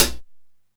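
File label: hat closed, Alesis DM5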